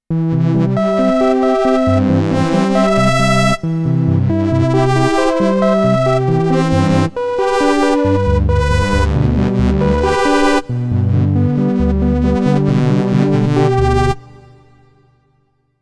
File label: KORG Odds Stochastic Phase Distortion